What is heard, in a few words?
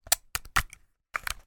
technology camera digital battery lithium ion push into slot